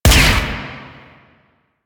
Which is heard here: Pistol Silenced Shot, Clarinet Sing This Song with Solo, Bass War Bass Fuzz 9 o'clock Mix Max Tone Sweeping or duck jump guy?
Pistol Silenced Shot